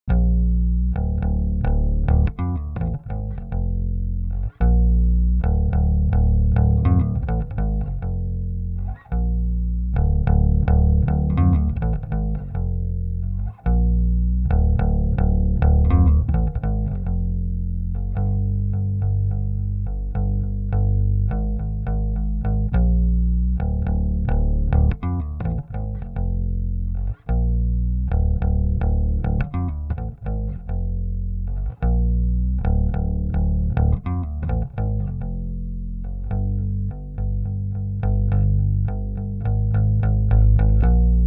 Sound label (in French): basse